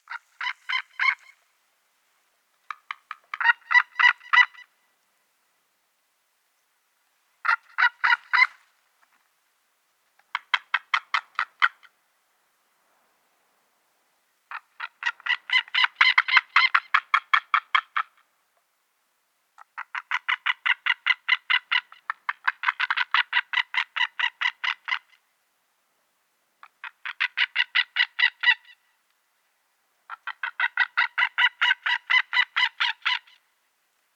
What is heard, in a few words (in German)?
Seeadler Rufe; Der Seeadler ist unser größter Adler; (Wappenvogel Deutschlands)